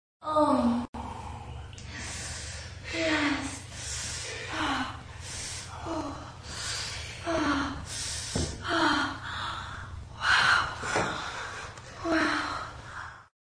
sound of orgasm

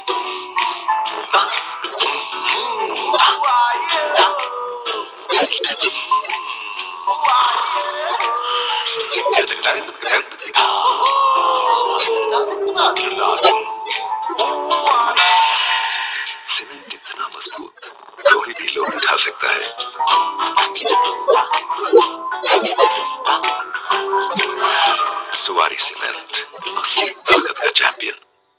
cement jingle; zuari; alternative

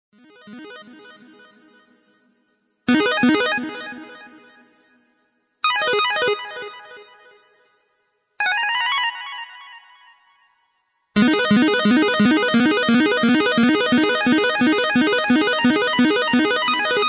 dubstep drumstep

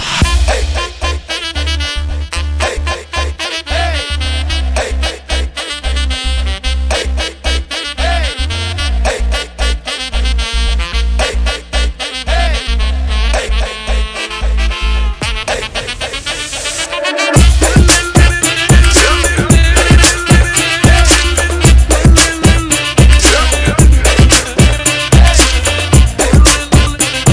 Arabian Music Clubbed

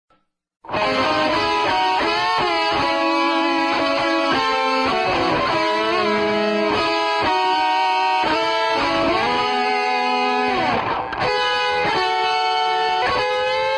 guitar

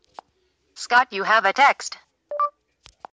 text message

